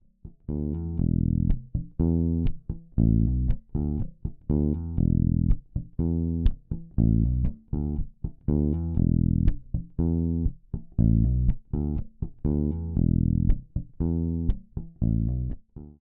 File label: LAKELAND MuMa TH Drive 0 EQ Flat